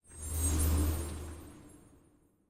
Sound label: idle orb float; smite; olorun